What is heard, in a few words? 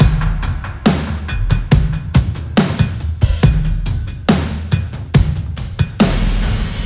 SloMo Beats, Loops 140 BPM